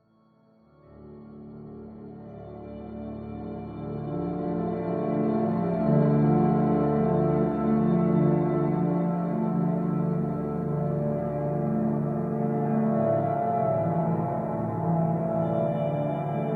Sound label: piano synthlike with more church bell; Pianoteq